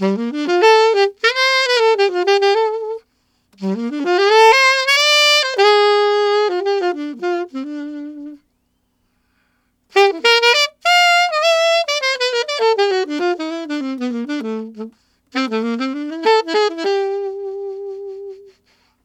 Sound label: Alto Swing G min, Alto Sax, Jazz; Sonic Foundry (Sony Creative Software)